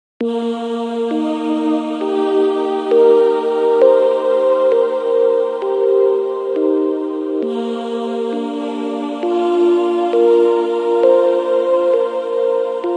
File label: wakeup; Nokia; 8800 Sirocco Edition